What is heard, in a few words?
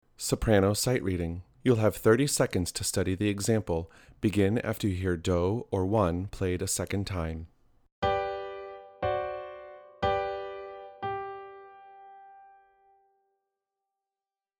Soprano in G